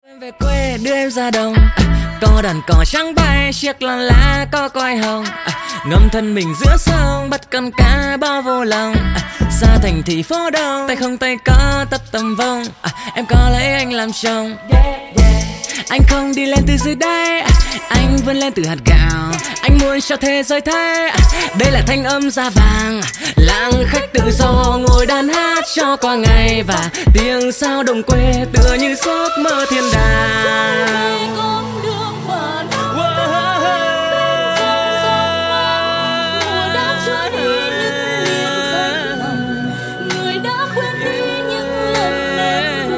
Rap Viet

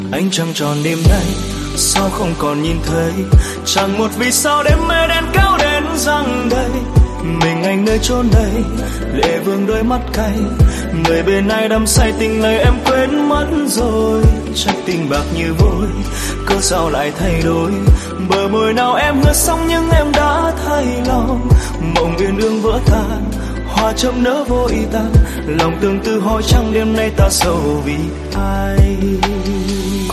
Nhac Chuong

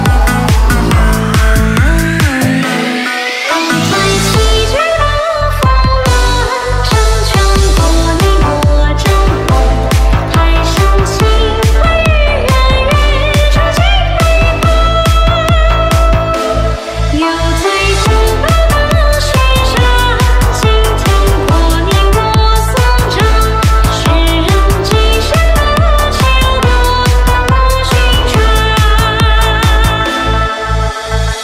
Nhac Chuong Remix

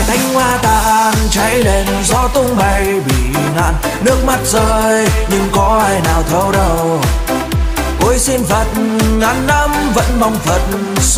Nhac Chuong Remix

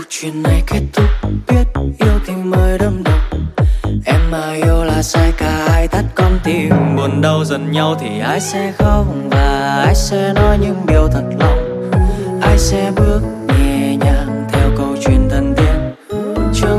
Nhac Chuong, Diep Khuc

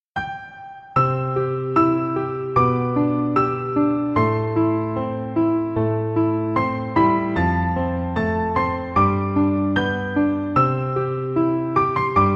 Giai điêu Piano; nhac khong loi; nhac chuong

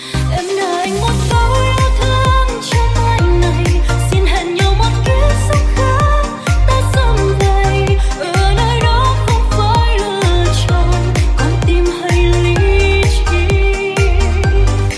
Nhac Chuong Remix

